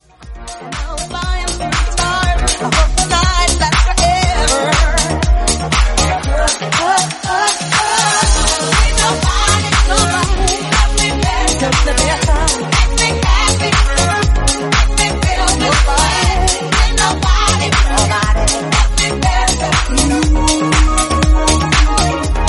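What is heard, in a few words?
120 bpm, E flat minor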